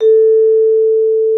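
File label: vibraphone A4; Xsample VOL-4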